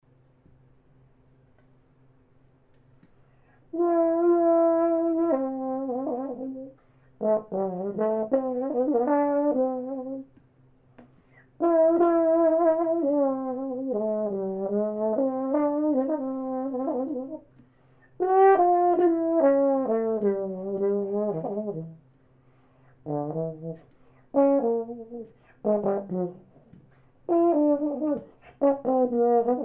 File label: plunger, solo trombone